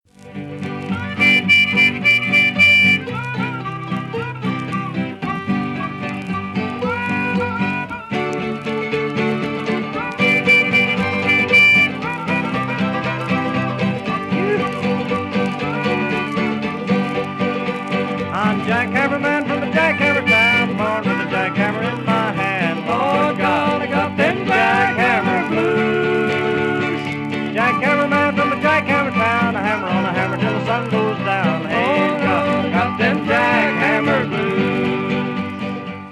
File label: harp